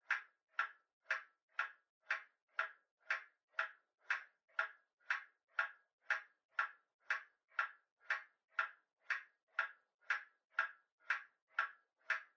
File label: Pendulum Clock Hardware Far Tick